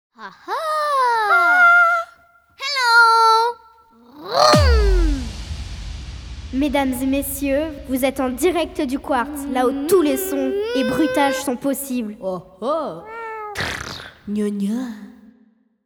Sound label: jingle